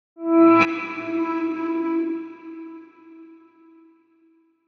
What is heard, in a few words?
ding